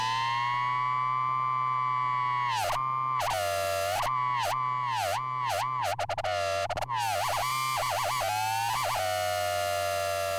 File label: dissonant noise